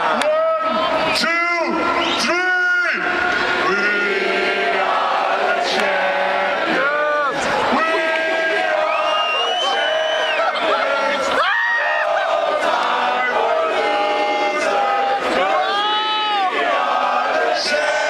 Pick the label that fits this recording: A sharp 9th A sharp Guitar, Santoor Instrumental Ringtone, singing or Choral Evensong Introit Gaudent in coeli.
singing